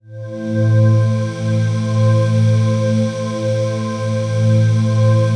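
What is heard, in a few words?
trance pad; Best Service; XX Large - Pads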